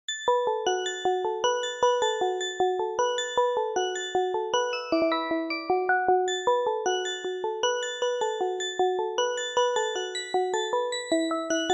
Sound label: Bomb Launcher